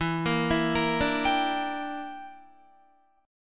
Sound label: E Minor 7th Flat 5th, Guitar; Em7b5; arpeggiated; 12,13,14,15,14,14